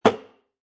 Bottle Place Down, Foley, Plastic, Almost Empty, Being Placed Down On Concrete; Windex Bottle; Set Down